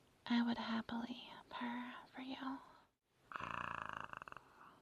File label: One little purr